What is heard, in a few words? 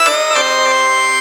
SYN, Roots Funk; USB Soundscan vol.41; AKAI